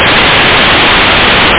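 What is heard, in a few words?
hi hat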